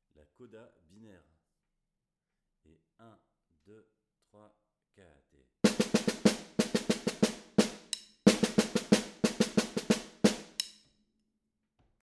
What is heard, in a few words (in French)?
Coda Binaire Snare, Batucada